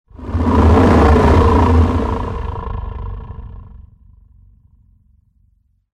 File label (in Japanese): モンスターの鳴き声 モノラル